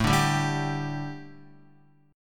A 7th Suspended 4th Guitar, chord; A7sus4; strummed; JGuitar

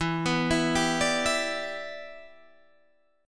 E Minor 7th

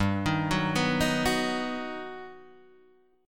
G Augmented Major 7th, Guitar, DADGAD

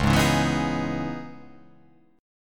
C sharp Minor 9th C sharp, chord

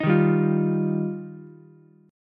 Eb Major Add 9th, Ukulele; Ebadd9 strummed; 8,3,3,8; JGuitar

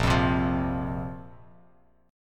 Bb Suspended 2nd Bb down Bass Standard; strummed

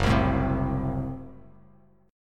F sharp Diminished Bass Standard; F#dim strummed

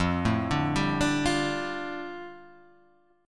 F 6th Add 9th F down Guitar Half Step Down, chord

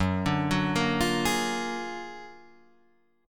F sharp Augmented Major 9th F sharp Guitar Open D

